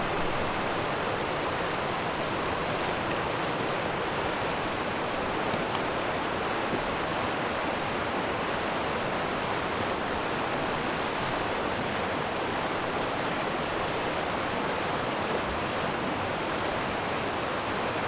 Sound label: River Far; field-recordings; Hidden Place; grenivik